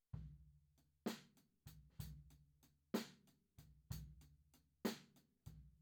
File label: Floor